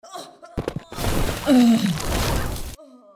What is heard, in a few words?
Heal; Goddess of Trampling; Straugle Heal FaceSit